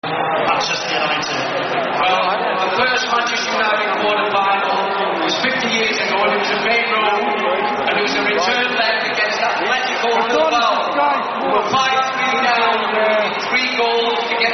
Fans Singing; United-Roma Fans Singing Glory Glory Man Utd

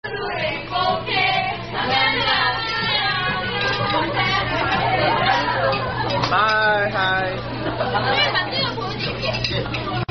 Song, Unknown Korean Song; Cold Stone Creamery Korea

